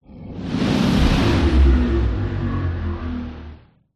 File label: sound design whoosh horror whoosh into robotic drone; zapsplat